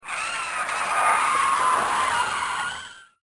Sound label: tires